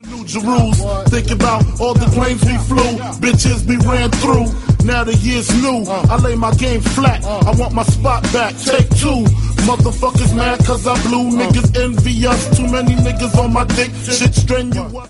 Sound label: longkiss